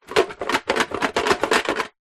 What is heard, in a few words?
food toffees in tin shake; zapsplat